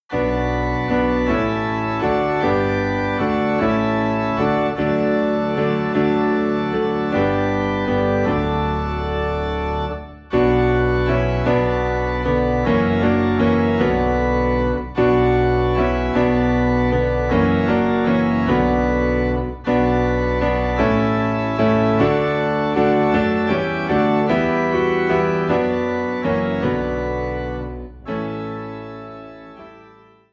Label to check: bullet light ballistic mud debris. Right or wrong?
Wrong